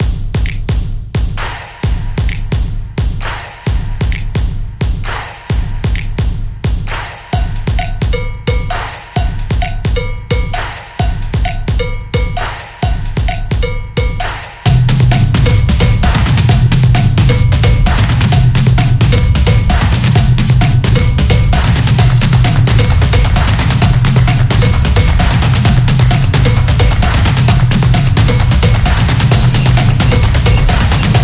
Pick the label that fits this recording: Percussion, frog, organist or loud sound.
Percussion